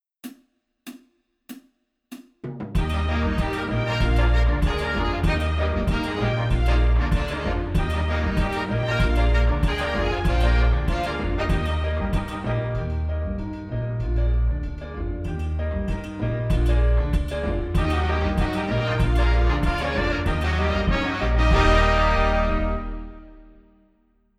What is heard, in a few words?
Calypso